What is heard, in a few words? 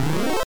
Open The Door; labyrinth maou no meikyu family computer